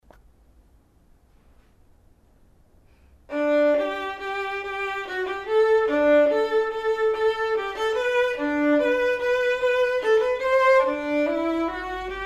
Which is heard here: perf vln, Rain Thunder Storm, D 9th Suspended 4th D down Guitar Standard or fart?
perf vln